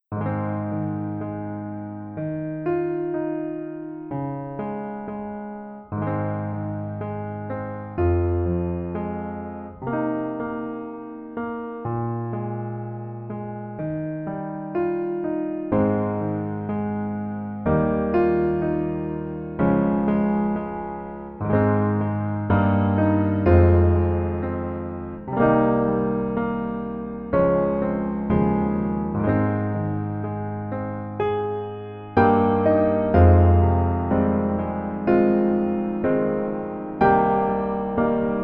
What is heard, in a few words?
Piano